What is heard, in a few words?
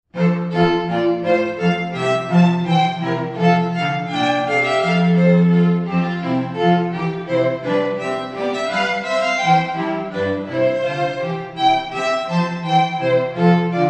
strings